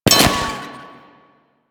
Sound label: Glyph Target Hit; Warframe; Duviri